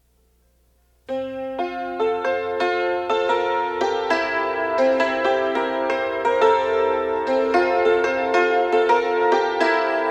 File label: Viola's Ditty, Hammered Dulcimer; American Hammered Dulcimer